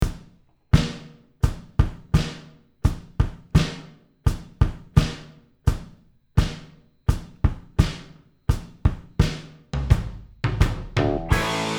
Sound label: Jam Backing Track